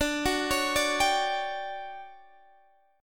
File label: D Major 11th Guitar Standard, chord; arpeggiated; JGuitar